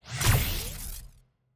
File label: Emmiter Crystal Press; TANK; 3Souls